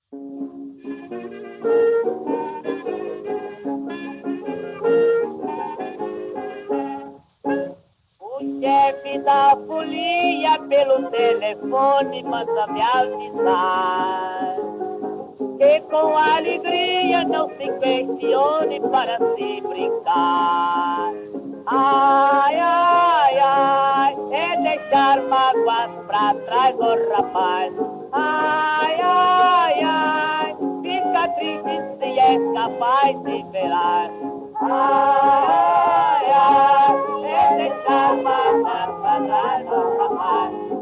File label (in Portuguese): samba; 1916; O primeiro samba Brasileiro